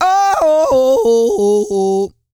REGGAE, Vocal Planet World Voices

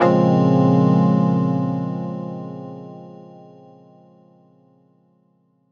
D#m7+9, Chords; Electronisounds

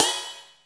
FX CYMBAL

